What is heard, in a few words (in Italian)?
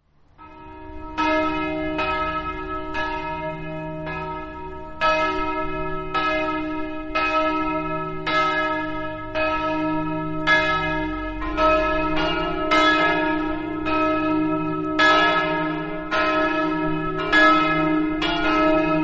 vendoglio, campane